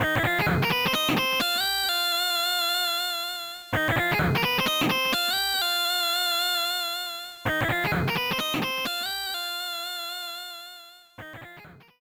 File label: Guitar; Guitar Study Creativity 6; the sims bustin out; gameboy advance